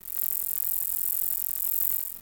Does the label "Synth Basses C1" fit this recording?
No